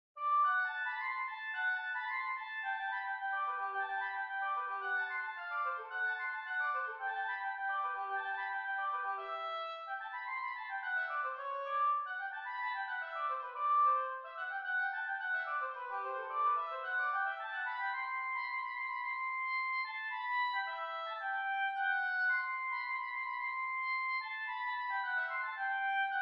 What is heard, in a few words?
Oboe Excerpt